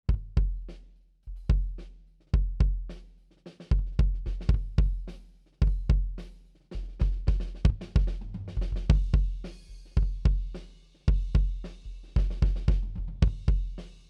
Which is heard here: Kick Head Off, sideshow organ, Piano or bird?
Kick Head Off